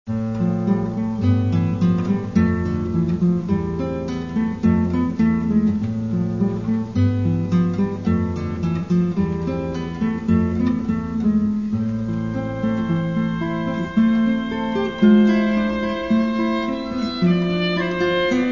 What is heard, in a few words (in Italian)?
cantabile e ritmico